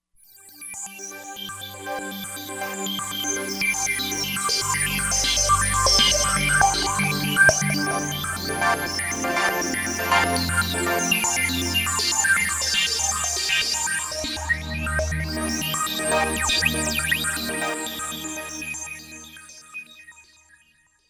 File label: Roland Fantom XR; Space & Time